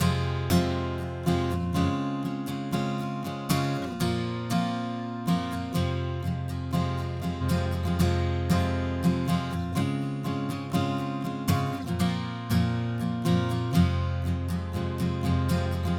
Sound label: Fmin 120bpm Acoustic Guitar Chorus